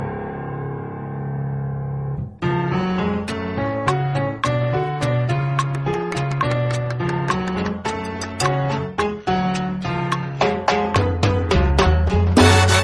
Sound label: sound latin ringtone